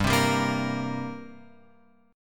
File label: G Minor Double Flat 5th, G; Gmbb5 Chord {3 3 5 3 X 6} strummed